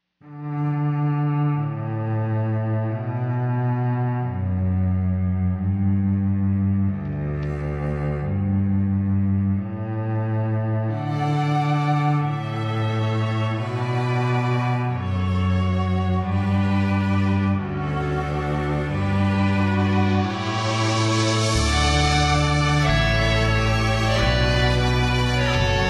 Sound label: Rock Cover